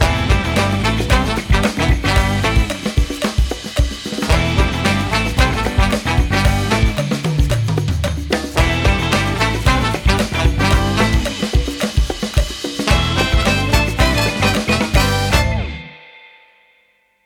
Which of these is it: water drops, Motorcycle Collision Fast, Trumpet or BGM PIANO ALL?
Trumpet